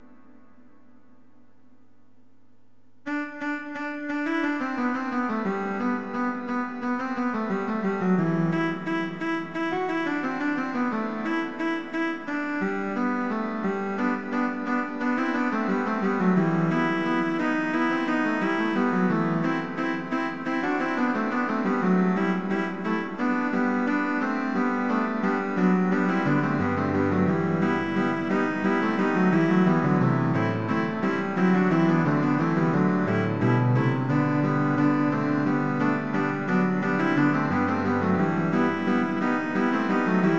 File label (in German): kanon fuer 3 celli partitur